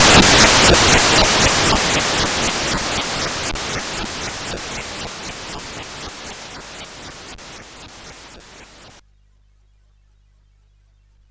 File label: Frantic, CSG8580R5